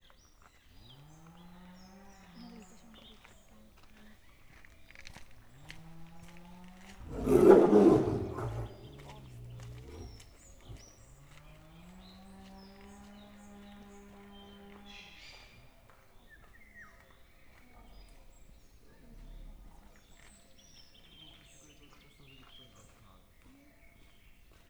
latogato utan kialt, bengalitigris; miskolci zoo 2009

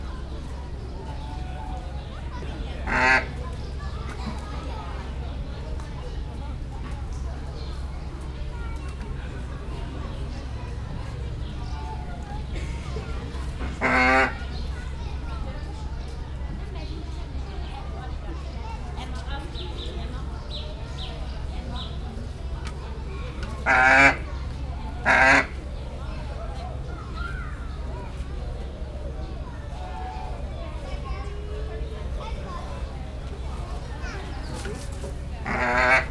háttérben gyermekcsoport, pusztai sas; debreceni zoo 2019

